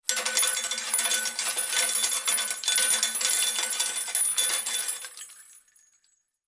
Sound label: medium casings on metal